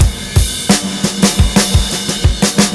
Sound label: breaks, 87 bpm; Zero-G Beneath the Planet of the Breaks